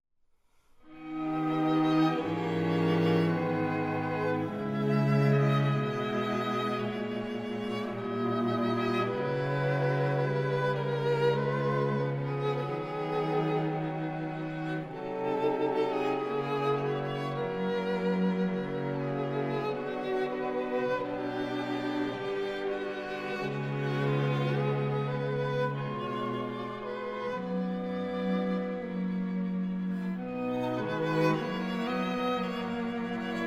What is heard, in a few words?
Quartet for Strings in D major; Franck